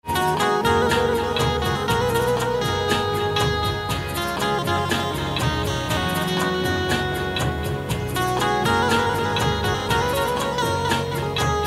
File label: Banjo